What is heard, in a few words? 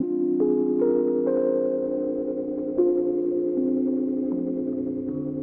magic, Electronic Loops